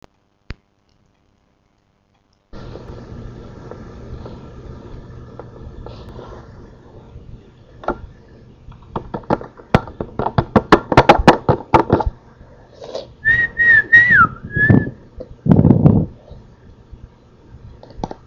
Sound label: Typing Whistling